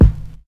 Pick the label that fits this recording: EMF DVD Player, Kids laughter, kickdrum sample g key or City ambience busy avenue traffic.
kickdrum sample g key